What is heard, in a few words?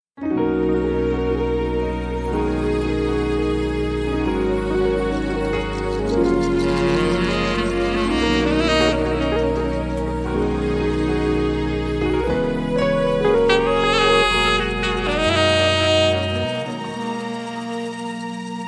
sexy saxophone